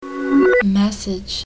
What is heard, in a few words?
message sms